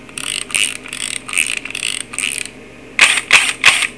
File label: robot royal